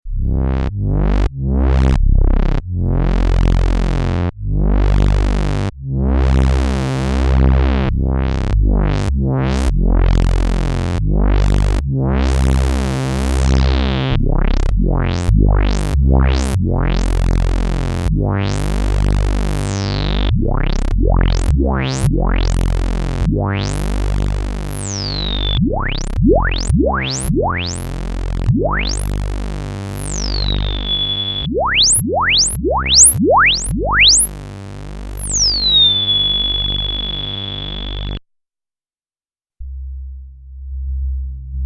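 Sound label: sweep, synth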